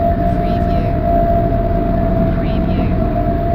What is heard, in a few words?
Engine Room loop